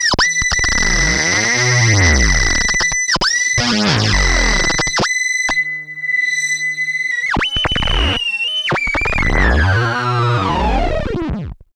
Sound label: analog, special fx twisted; USB Soundscan; AKAI